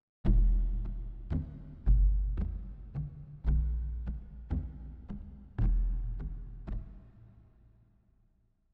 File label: Tribal Drums; Frozen Flame